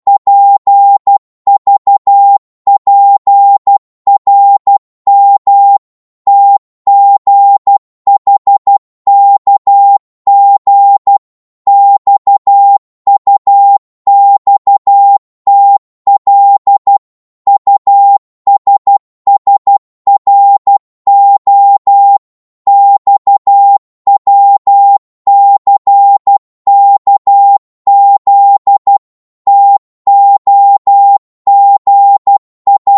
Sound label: 12wpm, Morse